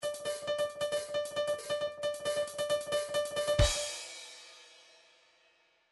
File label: trance midi loops fills sfx; Newtronic Trance Constuction Kit